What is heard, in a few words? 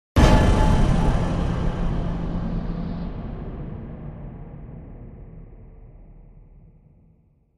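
Impact Metallic Clang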